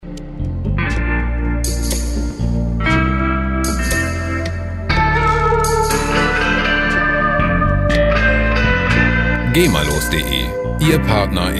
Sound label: twang chill